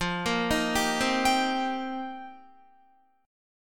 F 7th Suspended 2nd Suspended 4th, Guitar; F7sus2sus4; arpeggiated

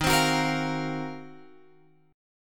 Eb Minor 6th Add 9th Eb down Guitar Open F; Ebm6add9 strummed

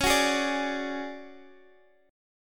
D Major 7th Flat 5th C sharp, chord

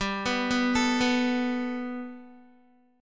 G Major Add 9th Guitar, chord; Gadd9; arpeggiated; 14,14,11,14,11,x

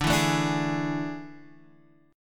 A Major 7th Suspended 4th D

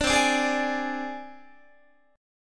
C Suspended 2nd Sharp 5th C Guitar; strummed; 4A,4E,5A,5D,5G#,6C#; 17,20,17,18,18,19; JGuitar

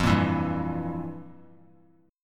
F Major 7th Suspended 2nd Suspended 4th, chord; strummed; 3C,3F,3A,3D; FM7sus2sus4; JGuitar

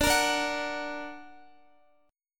D Augmented D down Guitar; D+ strummed; tuning 4E,4A,5D,5G,5C,6F

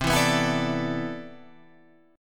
C Major 13th, Guitar Open G, chord

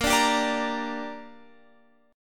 Bb 6th Bb, Guitar Open F; strummed

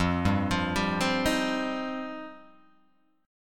F 7th Suspended 2nd F down Guitar Open G

